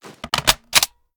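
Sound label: sniper reload empty mag in; gmod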